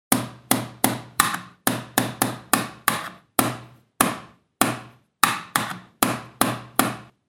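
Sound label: plastic sound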